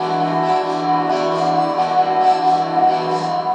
Stardusted, Pads; Sonic Foundry (Sony Creative Software); Synchro Funk

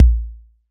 kick machine

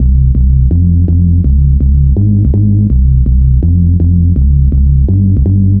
bass loop 165bpm C2, Drum&Bass; Total Drum&Bass

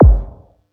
Yamaha EX-5

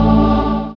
synth choir, dance synth; Sound & Vision - Gigapack I CD 2 (Roland)

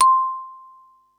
mallets

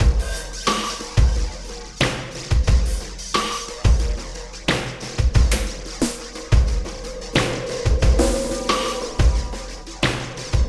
Sky Lab Beat, Processed Drumkits

